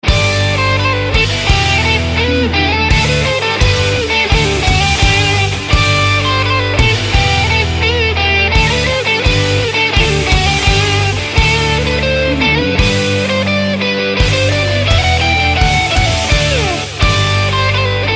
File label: deck the halls rock opener